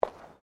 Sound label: FOOTSTEPS WOMENS LOW HEELS PAVERS STEP; Footstep Foley; Footsteps (Sound Effects:Human)